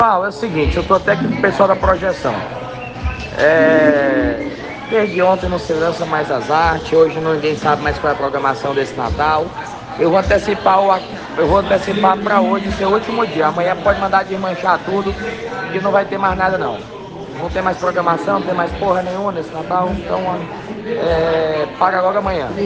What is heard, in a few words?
AAAAAAAaaa